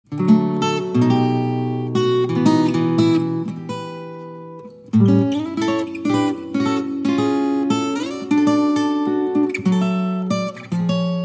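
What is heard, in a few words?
guitar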